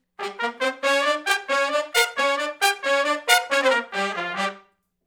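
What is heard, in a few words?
R&B Riff (G) unison to harmony, Horncraft; Sony Creative Software; Crimson Blue and Fabulous Horncraft 4 RnB; Horncraft for R&B; Sections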